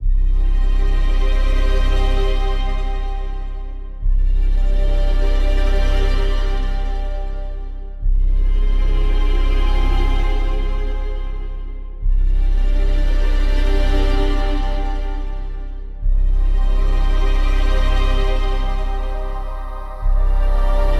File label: Oppressive ambiance; Tank Universal 2